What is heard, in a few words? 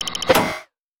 Full Charge; Hunters and Props